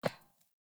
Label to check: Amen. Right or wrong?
Wrong